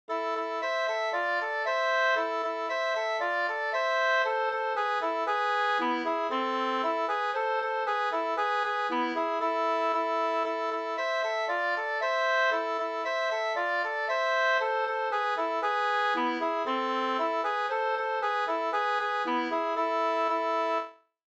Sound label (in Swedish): langdans ringdans